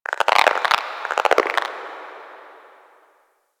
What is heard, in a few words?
Ocean Critter Frog; Warframe; Grineer Ocean